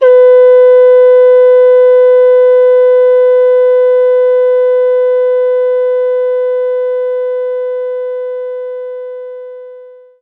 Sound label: Ocarina C5; knights try